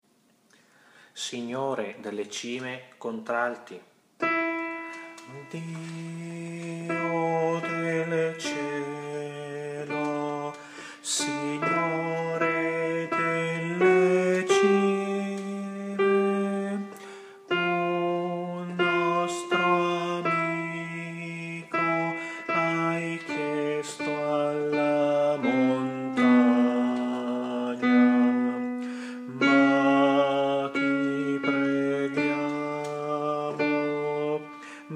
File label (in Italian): CONTRALTI